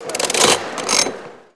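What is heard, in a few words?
casino ambience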